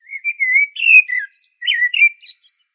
bird blackbird

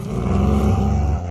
dog snarl

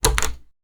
door open

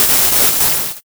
electro

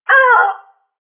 female death; ufoai; soldiers

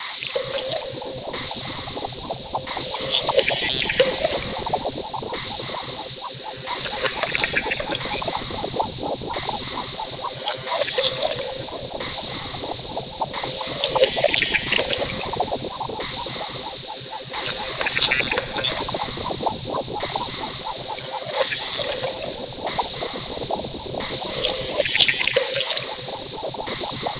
blood spider walk

